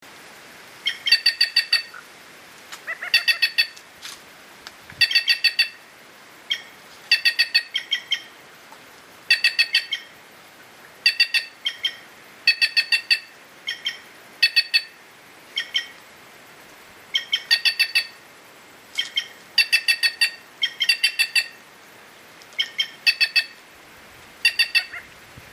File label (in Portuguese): gralha canca, canto